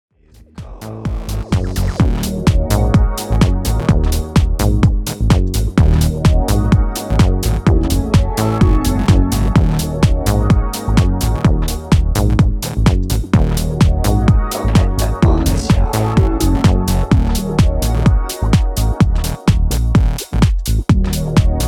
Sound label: electro